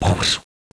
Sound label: explosion